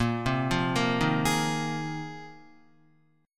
Bb 7th Suspended 2nd Suspended 4th Bb, Guitar 4F 4A 5C 5G 5C 6E; Bb7sus2sus4 arpeggiated